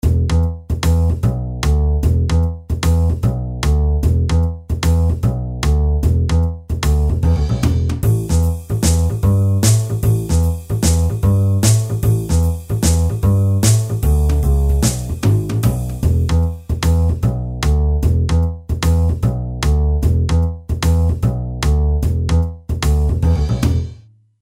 jazzin

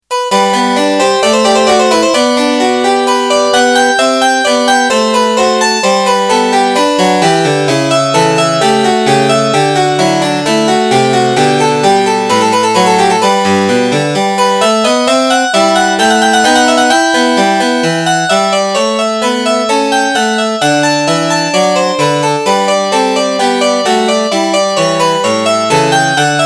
harpsi

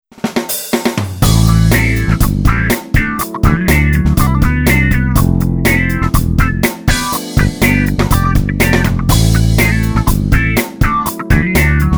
patch demo

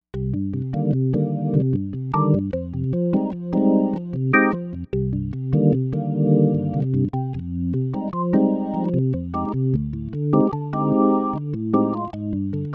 tonewheel org; Jupiter-80

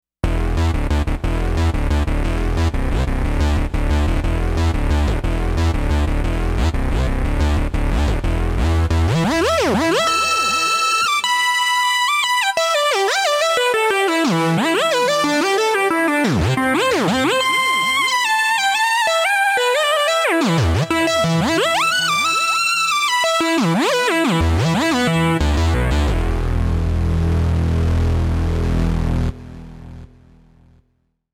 dirty voltage; JUNO-Di Patch Demo

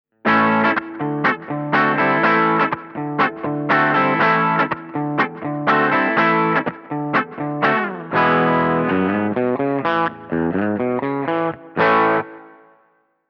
retro funk

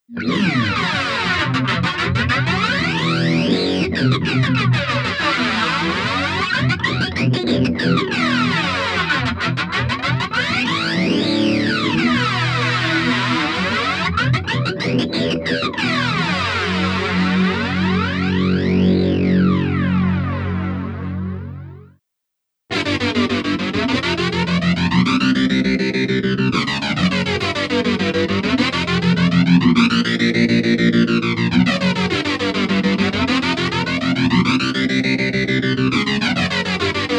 sound check

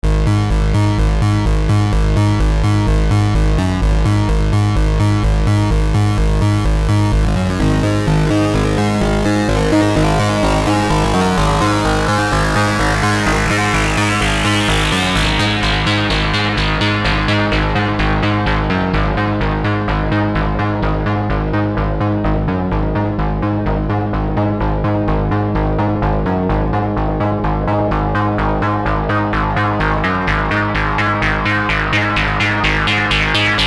bass synth

